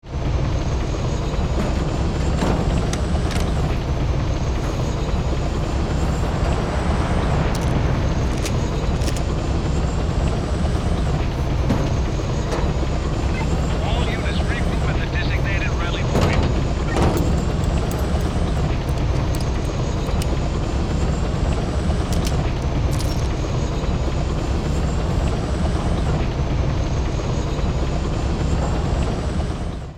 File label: Inside a tank battlefield preparation